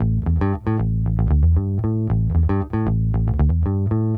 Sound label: Disco Bass, 70s Analog Funk; Sonic Foundry (Sony Creative Software); What it Is! 70s Analog Funk